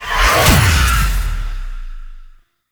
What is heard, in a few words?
Prayer Circle Start; Warframe; Tau; Roathe